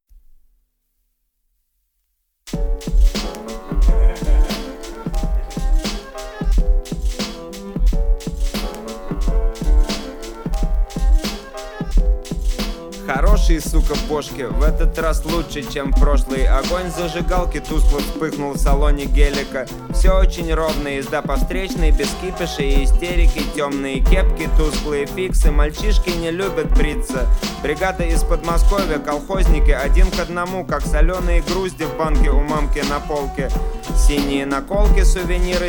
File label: 31-35hz Low Bass